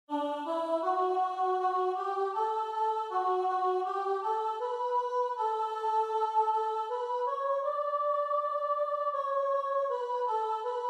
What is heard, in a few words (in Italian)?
i tuoi santi soprani